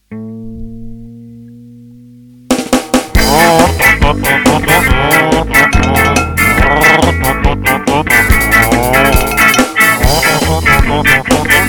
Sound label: ska